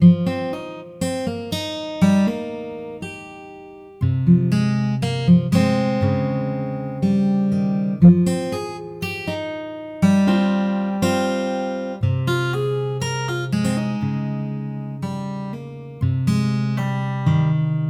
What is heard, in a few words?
Summer Guitar